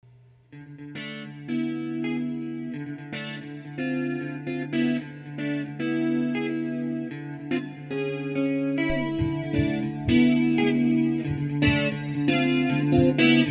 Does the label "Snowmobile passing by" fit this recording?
No